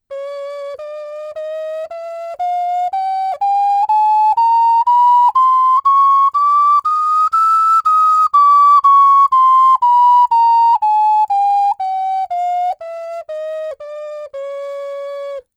Oc 6 hole scale, ocarina

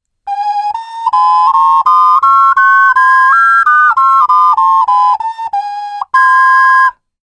Soprano Duet scale, ocarina

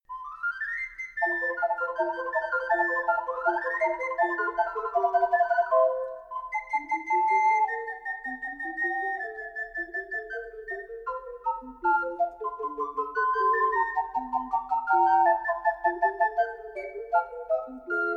Beethoven Snip, Ocarina; 123ocarina; music maker

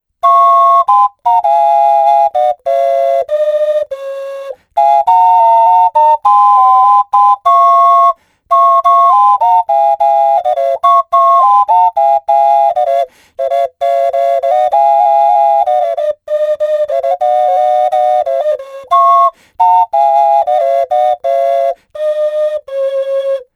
Alto Duet Joy to the World, ocarina

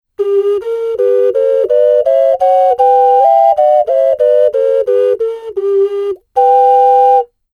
Tenor Duet scale, ocarina

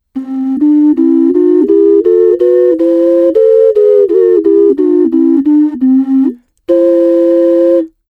Bass Duet scale, ocarina

